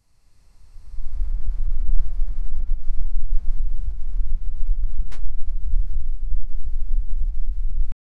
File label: theres an abrupt short we, horror